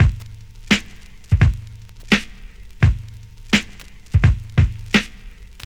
85 bpm 2000s drum beat A key, kick tuned to the A note; drum loop